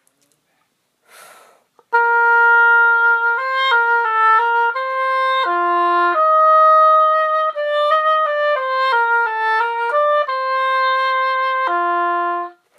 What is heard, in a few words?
oboe